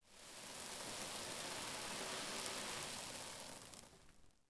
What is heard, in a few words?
desert sand; Ambient; Civ4 warlords